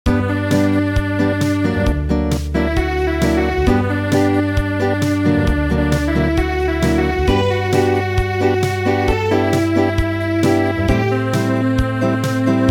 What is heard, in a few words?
Acoustic